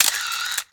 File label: Camera Shutter